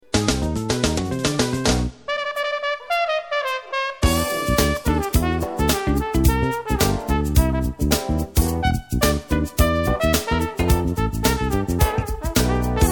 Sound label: Tp Solo